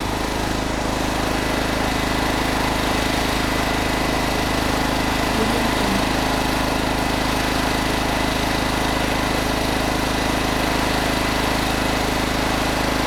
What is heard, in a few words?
generator